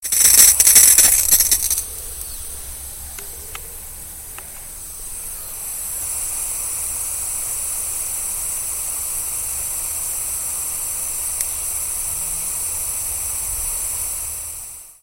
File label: sprinkle